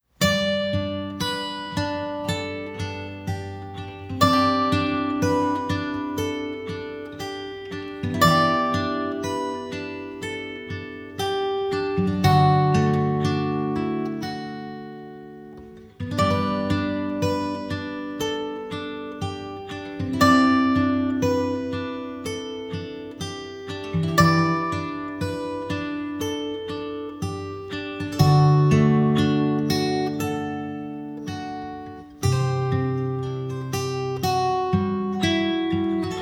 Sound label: Tiny Triangle Lullaby Instrumental